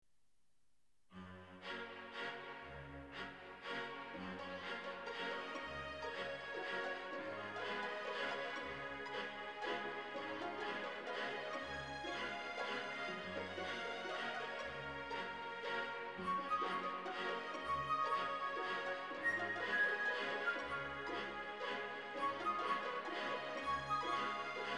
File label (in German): Glockenspiel Walzer